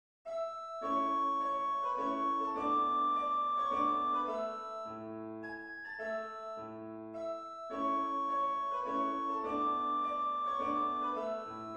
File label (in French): danse allemande, la majeur